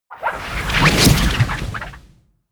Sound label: swoosh